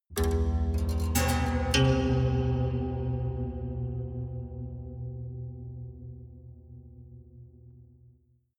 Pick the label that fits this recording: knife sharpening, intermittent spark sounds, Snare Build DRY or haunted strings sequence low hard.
haunted strings sequence low hard